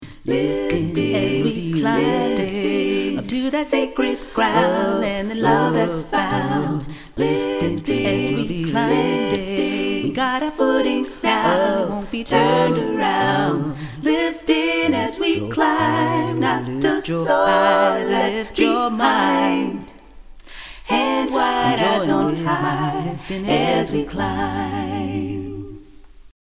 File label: ALL, choir; freedom choir